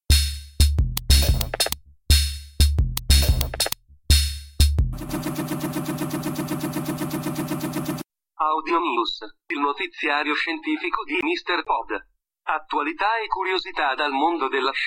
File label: jingle audio news